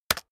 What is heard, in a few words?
keyboard external space hit very fast